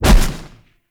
Dash Hit; Powers; Warframe; Focus; Operators

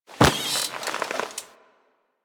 Classroom Chair Sit; Ambience; Warframe; The New War; Quests; Zariman

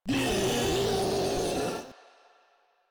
Infested Ancient Vocal Attack; Warframe; Proto Infested

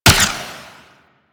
Flare Launch; DE; Warframe; Weapons; Archwing; Powers

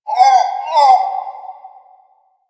Drifter Camp Creature; Ambience; One Offs; Creatures; Warframe; Quests; The New War

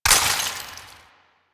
Ammo Pickup Viral; DE; Warframe; Warframes; Frumentarius; AmmoAbility

